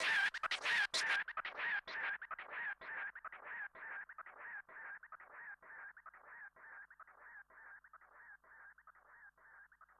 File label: FX, viral hiphop